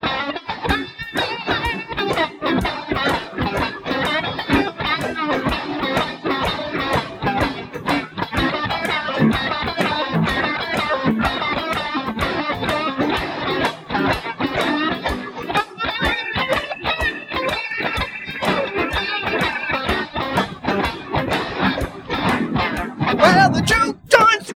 Free Music percussive, Phase based harmonic percussive separation